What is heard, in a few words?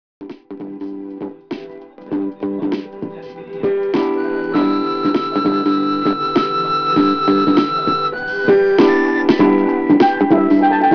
pop romant